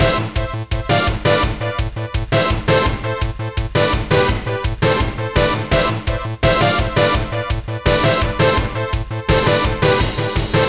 Car Settings; F-Zero Climax; GBA

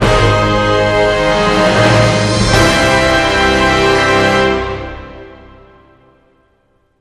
Battle Win Stinger; Disney Infinity

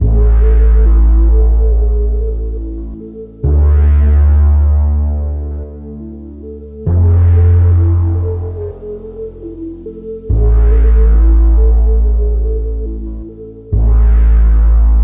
Bass; Gonner

